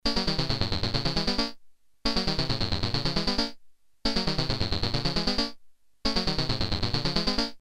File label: S and H; Sound w/Sample and Hold; analog synth; Alan McKerchar